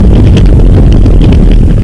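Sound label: rock move; baseq2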